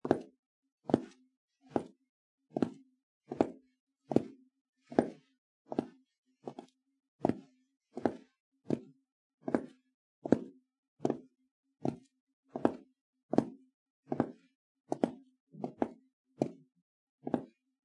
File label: footsteps on wood floor